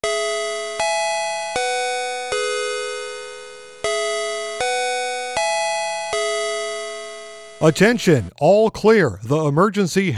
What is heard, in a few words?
All Clear